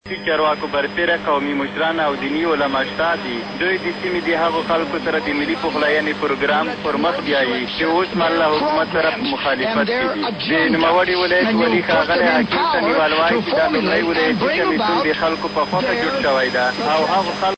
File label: grr